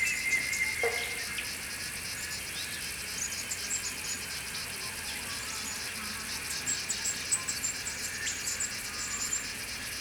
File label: morning, piano